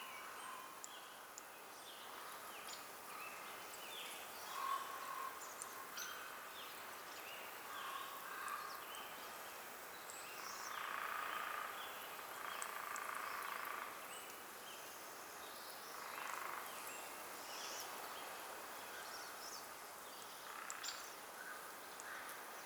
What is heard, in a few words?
ambience coniferous forest day calm dry; Rust